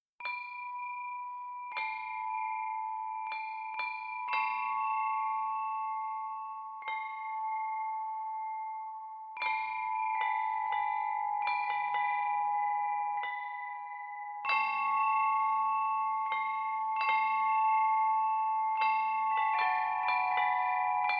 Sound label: Gong Bells